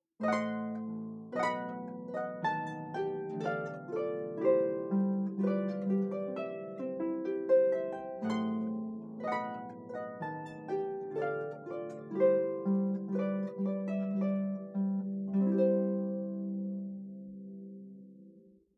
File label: Wedding March, harp